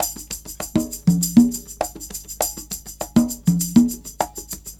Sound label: Percussion, R&B Drums